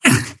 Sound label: pain small